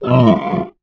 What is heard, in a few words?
monster grunt pig